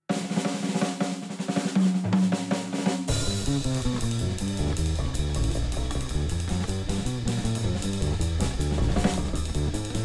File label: Mission Music Tempo Up